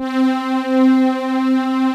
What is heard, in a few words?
ANALOG PAD; TR PADS; Pro-Rec - Synthsonic